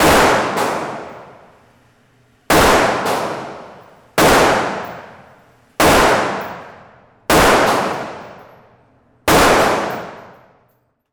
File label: AR-15 Shoot Out Shot Gun; Nova Sound